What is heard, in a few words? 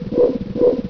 BEAM; XWINGTIE